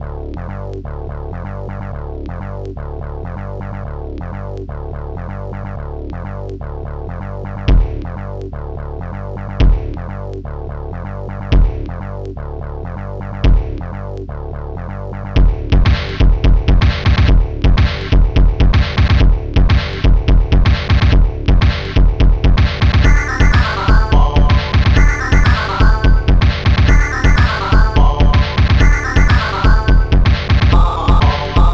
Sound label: Latin Beat; Archimedes Tracker